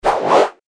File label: fight swish back